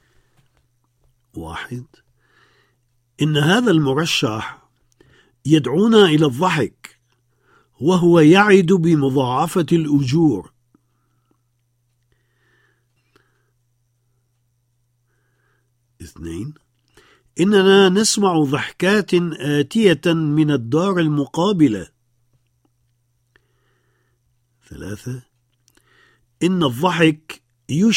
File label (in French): RIRE